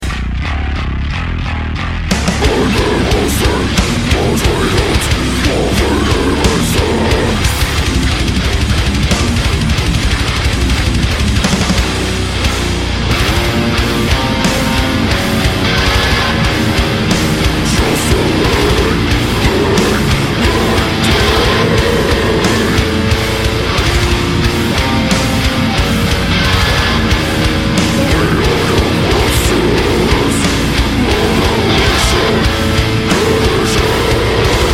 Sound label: Deathcore, Breakdown